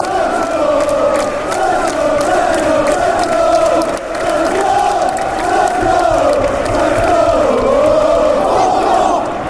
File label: time fanchants